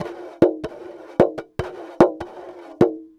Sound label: SCRATCH AND HIT CLAY DRUM; Sonic Foundry (Sony Creative Software); Vir Unis Infusion